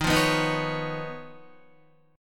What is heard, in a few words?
Eb 7th Suspended 2nd Sharp 5th Eb, Guitar; Eb7sus2#5 strummed; JGuitar